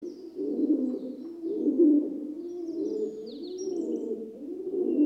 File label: pigeon